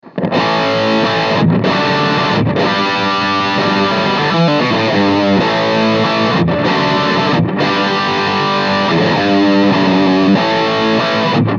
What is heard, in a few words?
Engl Fireball Distortion Line Out